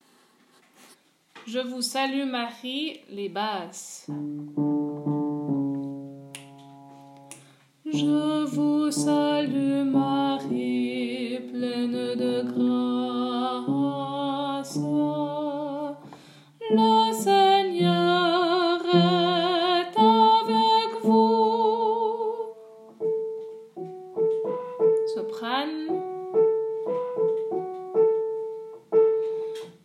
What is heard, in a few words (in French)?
Je vous salue Marie Basse